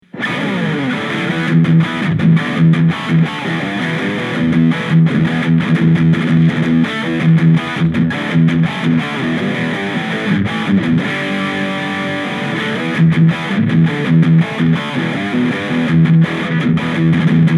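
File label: Blackstar Super Fly Lead Humbucker, guitar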